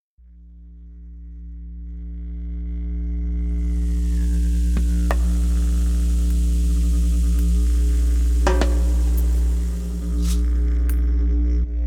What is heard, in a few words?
Transition; Ozymandias